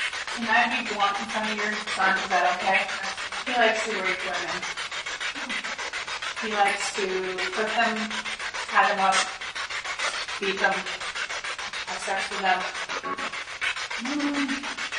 Civil War Wing Basement Spirit Box; madison seminary investigation

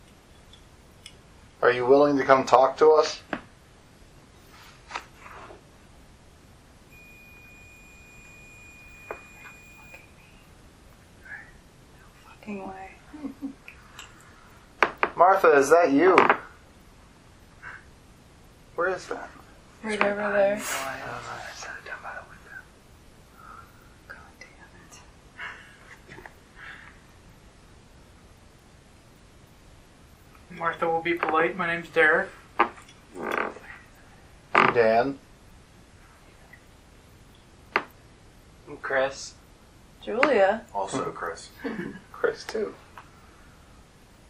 Third Floor Hallway EVP

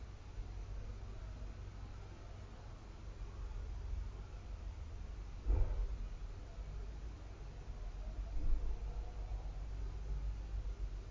Static Empty House Located on Main Staircase

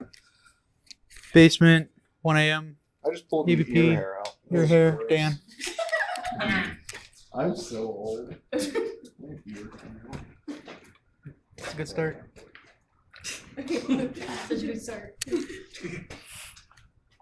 Barbershop EVP

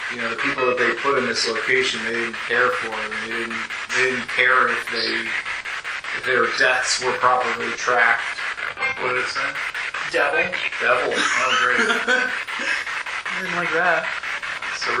Physician Room Spirit Box; fairfield county infirmary investigation

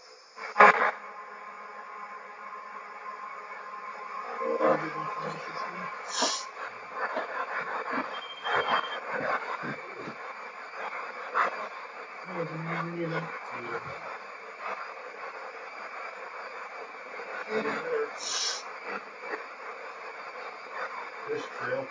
Library Spirit Box; wexford general store antique shop return investigation

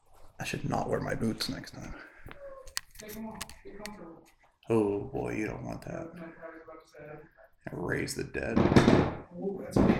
Mourning Room EVP

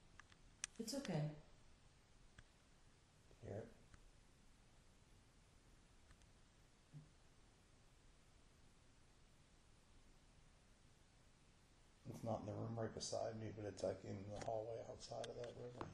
Mourning Room EVP

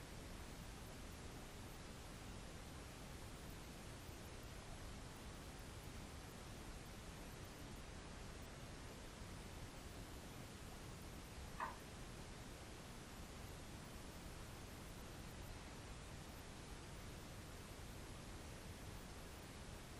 Grey Indian Head Room Static; wexford general store antiques investigation

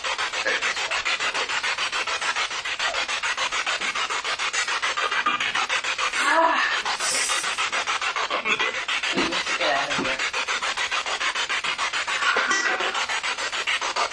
Spirit Box; Civil War Wing 3rd Floor Across form Armour Room; madison seminary investigation

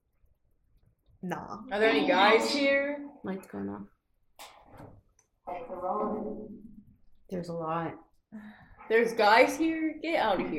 Barbershop EVP Girls Only

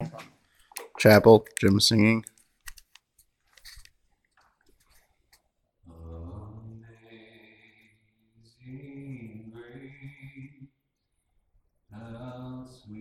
Chapel EVP

